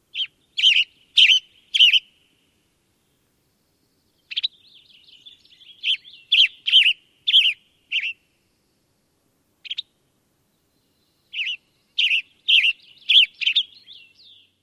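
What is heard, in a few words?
short songs & calls, Western Tanager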